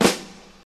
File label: subtle reverb steel snare drum sound g key